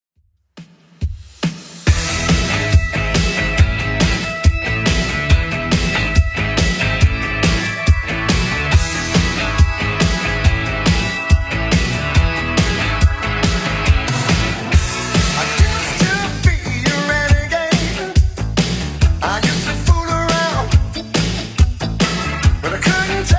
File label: 80s Club Edit 140bpm